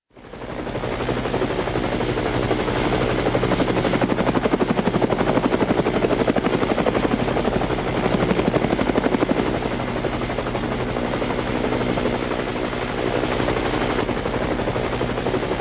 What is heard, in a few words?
Realistic Helicopter Sound